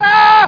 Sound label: scream, vocal